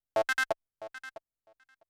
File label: HI SAW FX, Techno 138 BPM; Best Service ProSamples vol.54; AKAI